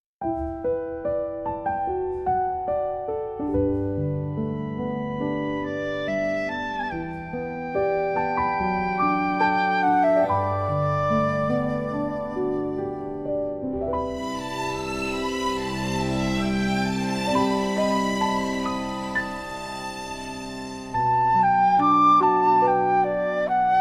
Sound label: Forest Memory Wind, fantasy music nature forest; ghibli style; healing; nostalgia